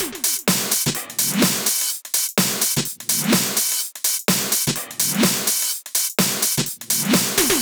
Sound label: 126BPM Pong Kit